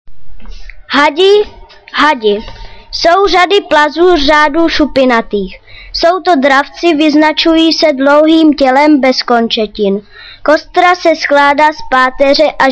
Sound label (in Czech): had, zvirata